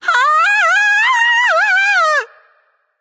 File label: fear light; smite; voicepack; hel